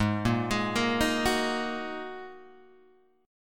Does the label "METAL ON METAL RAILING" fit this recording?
No